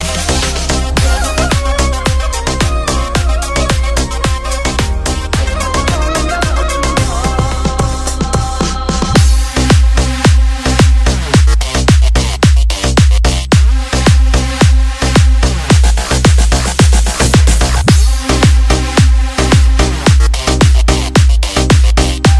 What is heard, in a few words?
turkish electro